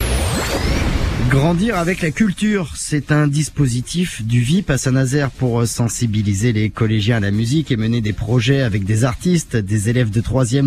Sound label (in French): atelier hiphop; Jean Moulin